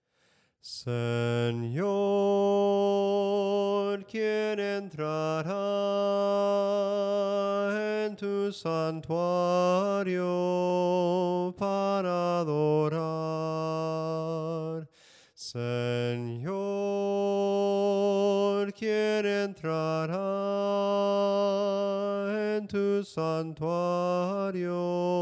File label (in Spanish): SOPRANO CANTADO; cantos del camino